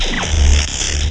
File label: Power plant short circuit